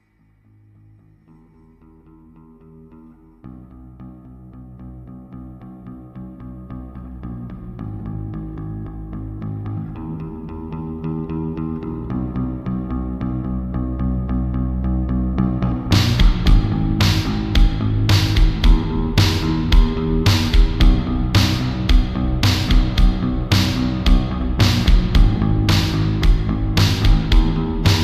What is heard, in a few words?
slowed n reverb